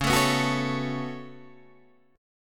Db 7th Suspended 4th Sharp 5th, Guitar, Half Step Down; chord shape